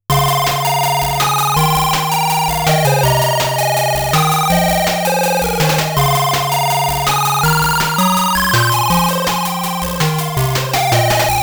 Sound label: 8bit